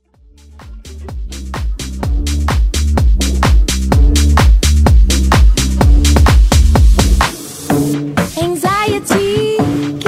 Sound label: Mash Up